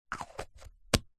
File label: leisure can silly string lid on